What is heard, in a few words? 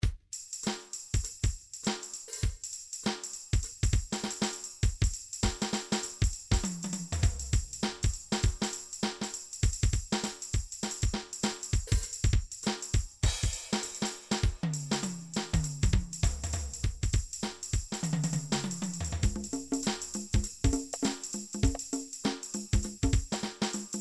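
shuffle rock, midi; Sonar Shfl Rk1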